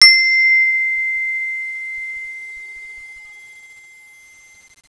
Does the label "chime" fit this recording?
Yes